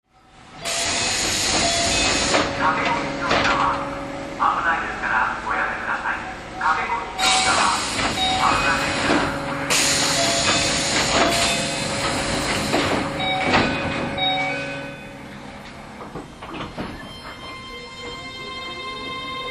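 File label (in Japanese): VVVF車 長沼→北野, 走行音; Keio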